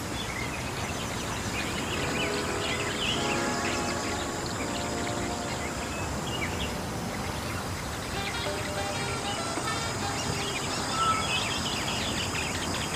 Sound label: Ambient White Dryforest; Angry Birds Trilogy; xbox 360